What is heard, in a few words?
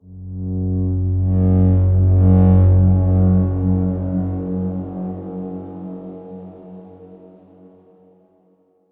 Darkswarm F#1 ff; HSS Ableton Pack1